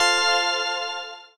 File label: autoplay on; Grand Casanova